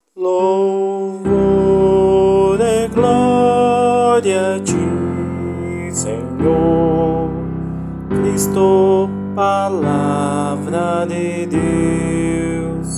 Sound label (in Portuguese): coro